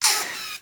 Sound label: Gun, Bullet Pass By And Ricochet; gun and weapon sounds; captain audio; fully loaded